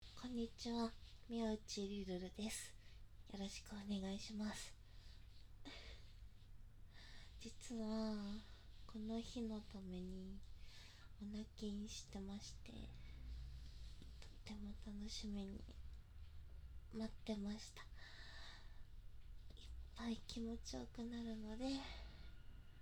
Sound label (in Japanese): 孕ませたくなる奇跡のエロ下品オホ声, 実演オナニー, 狂いながら快楽絶頂; 『お゙ほっ!!お゙っ!お゙っ!イク!イグ!!イグ!!!』